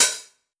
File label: Open Hat Wind, 909; Slate; Vintage Drum Machines Volume 3 909 Edition